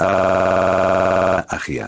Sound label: aaaaaaaahgia